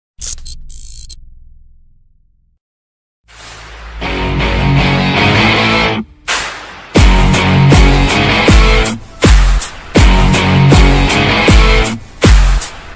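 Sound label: attitude background music ringtone; adithya varma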